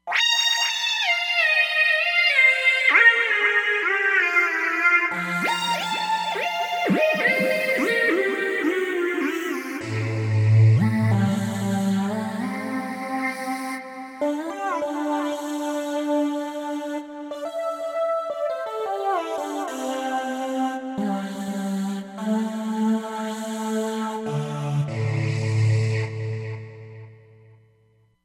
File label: Careless Vox, Roland Fantom XR